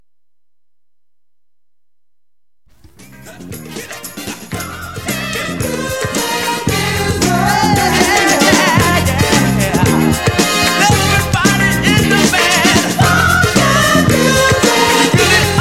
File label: funky music